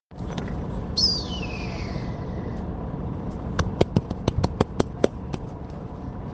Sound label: fireworks